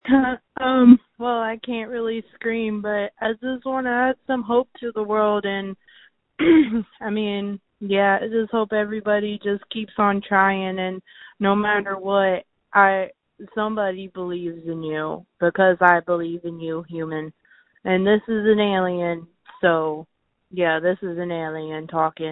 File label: screams